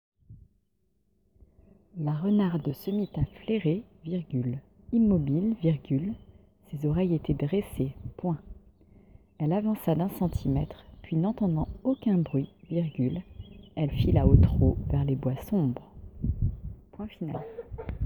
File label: Vocal